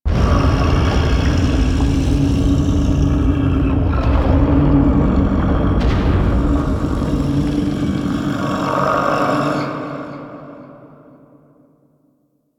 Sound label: Shaking Lab Rumble; ambience; Quest; Warframe; Entrati